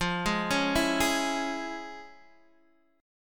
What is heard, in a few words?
F Minor Major 7th Sharp 5th, F, Guitar, Open D; x,8,6,7,7,6